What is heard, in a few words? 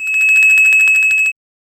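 8bit high pitched interface; game effects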